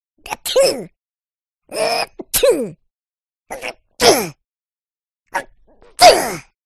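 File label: cartoon sneeze sound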